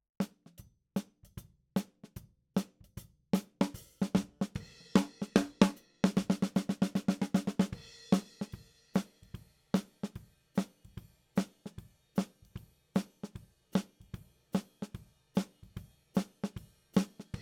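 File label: Snare top, Ragtime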